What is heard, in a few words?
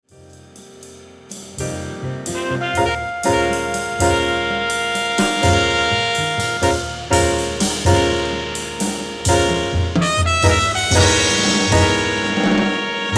classic jazz